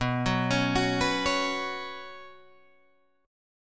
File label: B Suspended 2nd, Guitar, Drop D